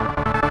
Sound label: synth